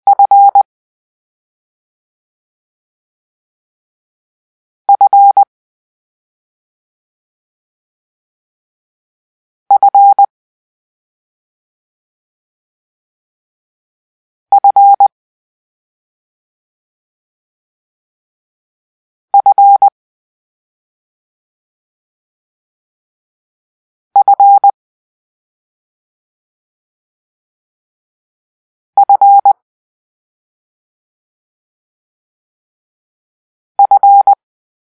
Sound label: Foxtrot (F), morse code